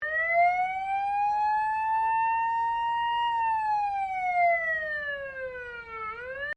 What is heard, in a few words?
SIREN FIRE ENGINE LOOP, Old fire siren; MFB 1969; Sirens; Electronics